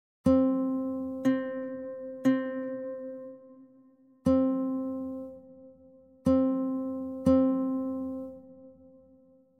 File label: Lento 60